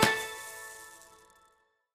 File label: count Up End; colossal pinatas